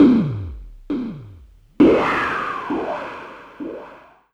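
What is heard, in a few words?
MINX snare drum, drum loop; Big Fish Audio; Uncivilised G'rooves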